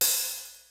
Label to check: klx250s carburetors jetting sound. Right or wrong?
Wrong